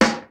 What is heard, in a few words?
kicks snare, kid; Kid Nepro; Elite; KICKS SNARES